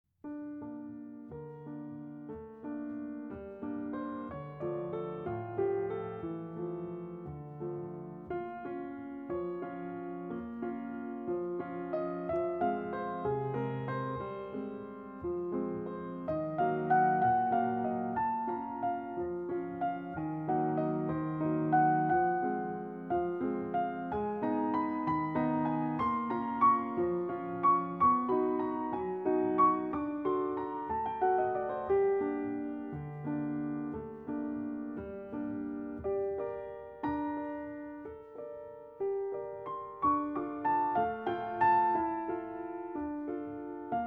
piano solo

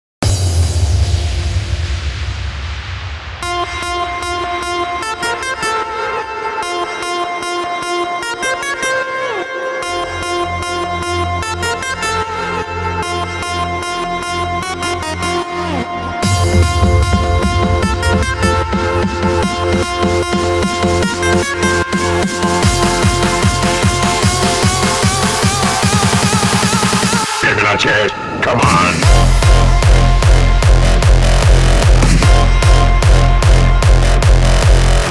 hardstyle version

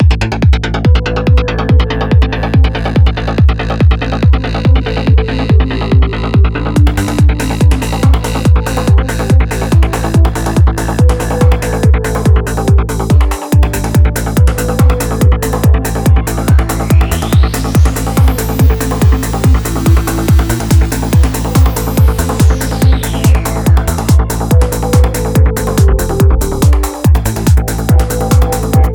trance 142 bpm running mixed

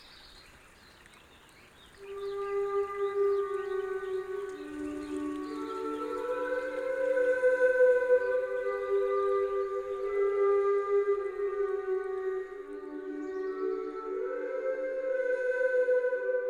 nature soundscape

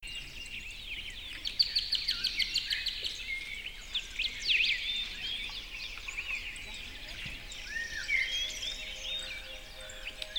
tranquil birdsong mixed with music

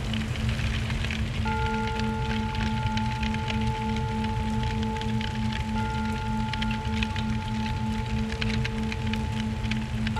evening vespers with rain and fire sounds 432hz; healing

